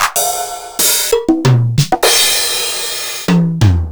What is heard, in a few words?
Roland CR-1000